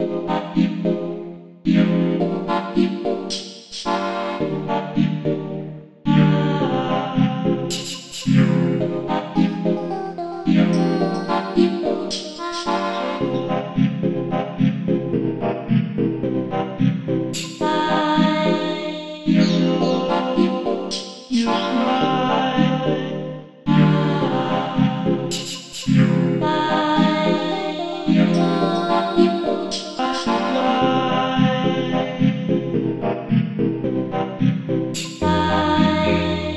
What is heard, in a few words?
keygen song; sven